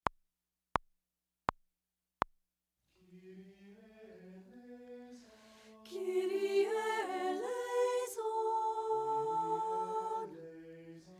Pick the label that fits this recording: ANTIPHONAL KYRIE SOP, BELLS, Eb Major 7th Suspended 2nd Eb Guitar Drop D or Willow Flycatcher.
ANTIPHONAL KYRIE SOP